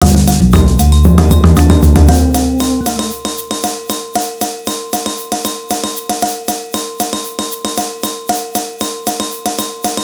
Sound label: Samba Limbo BREAK; SO Clips